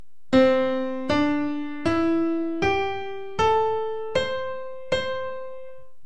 ancient scale; ling lun

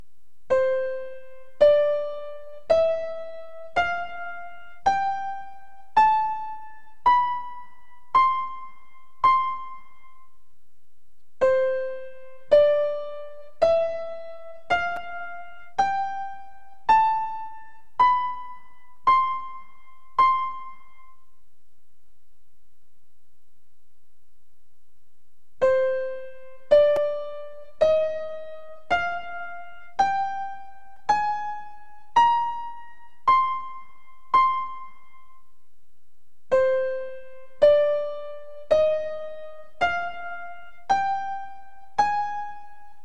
major and minor scales just pyth

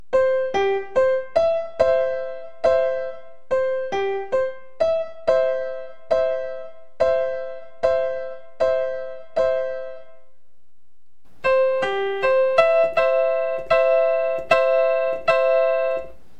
three major thirds

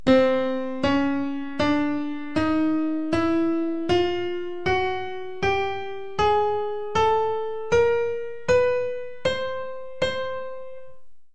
lu scale

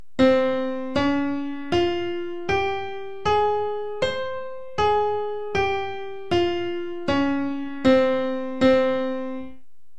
Greek Olympos ascend and descend different Db

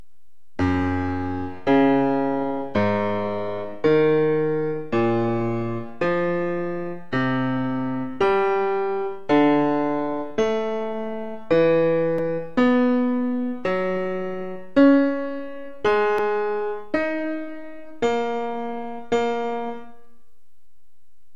arabic fifths

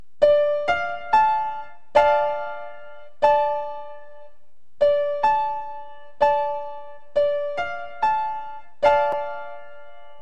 d minor wolf no wolf

